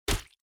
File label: bow